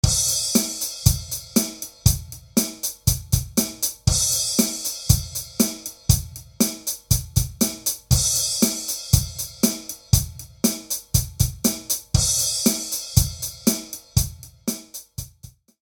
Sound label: markbass bass